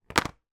technology tablet plastic down on table; zapsplat

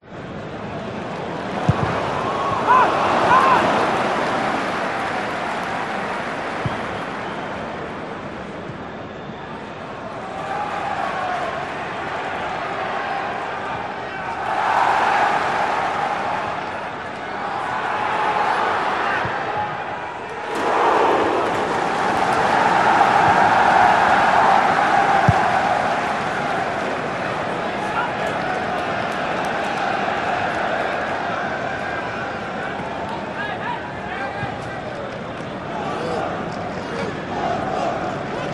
sport soccer match stadium crowd chant cheer

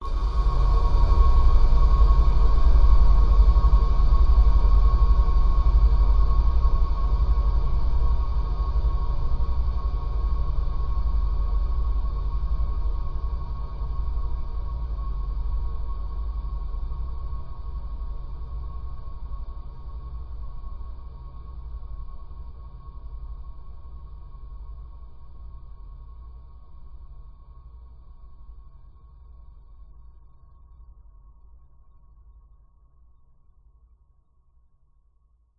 cinematic hit long decay airy dark sinister; sound design

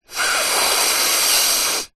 human snort nose through straw

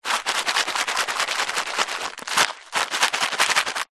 food sunflower seeds pack shake

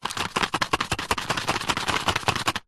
office staples box heavy duty shake

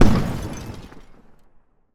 explosion large glass debris; sound effects one

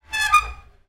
foley wood rail turn squeak; zapsplat

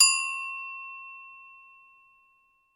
bell small; sound effects one

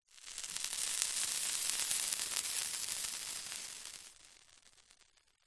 human skin burn sizzle scald; zapsplat

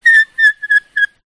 paper blowing air on edge whistle; foley

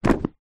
household book thick paperback set down; zapsplat

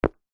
foley footstep single football boot on concrete

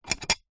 foley glass thin small sheet pane down on surface

